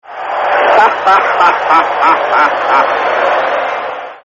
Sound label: hahaha; Ringetoner